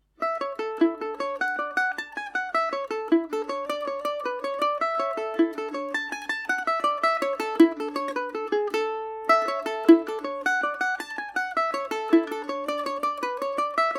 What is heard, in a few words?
pacific jig amaj, mandolin; Paris Swing Macaferri mandolin; The Pacific Jig